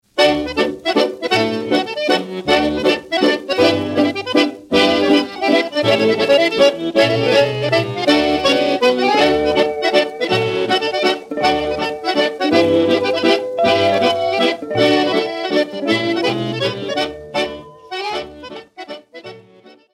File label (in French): Hambo, Suede